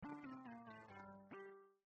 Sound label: game over